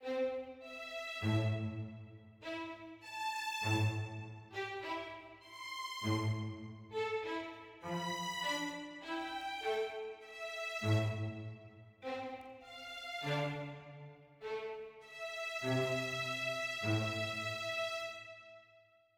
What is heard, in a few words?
mysterious med; Quivr; PS4 Windows gamerip 2016